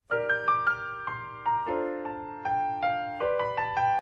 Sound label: Sms Piano